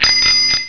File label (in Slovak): Bicykel; Doprava; Revelation Sight and Sound SK